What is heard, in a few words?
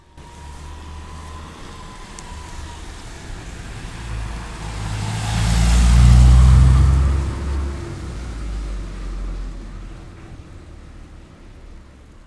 Car Moving Wet Street